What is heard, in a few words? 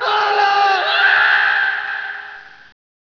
man screaming